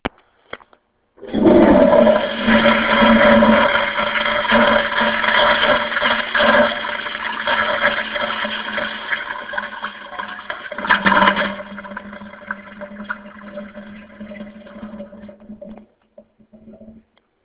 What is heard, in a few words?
flush